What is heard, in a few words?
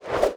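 weapon infantry spear; Battle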